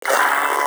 Proto Infested Maggot Awake; Warframe

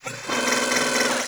Foundry Motor Backward; Liset Machines; Tenno

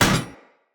Hammer Impact Metal; Weapon Impacts; Tuvalkane Hammer; Environment

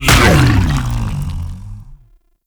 Consume Impact C; Warframe; Grendel

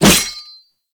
Whoosh, Weapons; Infested; Coda Mire

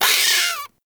Forest Rodent Vocal Pain; Vocals; Warframe; Characters

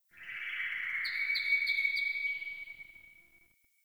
Tree Distant Critters Happy Calm; Warframe; Duviri; Ambience

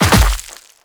Land Dirt; Warframe; The New War; Kahl; Footsteps

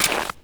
Step Ice, Footsteps; Warframe; Grineer; Royal Jester; Foley

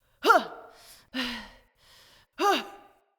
Attacks Vocals Female; Bromius; Wazard; Envoy

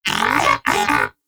Pet Tenacious Greeting; Pets; Warframe; Moa

